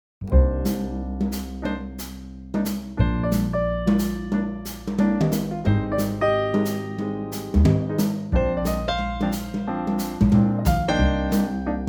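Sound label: C minor trio; key - Cm - vocal range - Bb to Bb; swinging Trio arrangement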